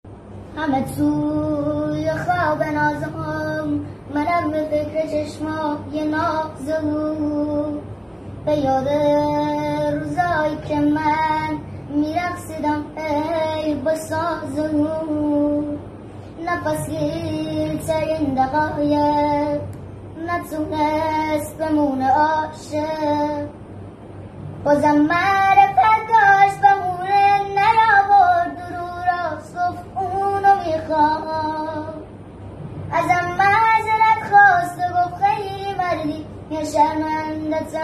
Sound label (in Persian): Ba Sedaye Bachee